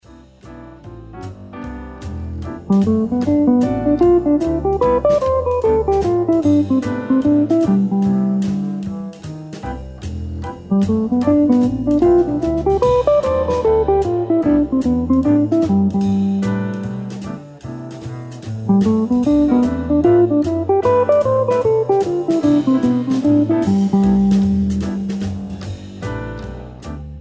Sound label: ionian mode guitar lick